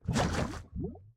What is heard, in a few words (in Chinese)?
熔岩上行走声; 炽足兽